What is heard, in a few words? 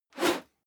whoosh whistle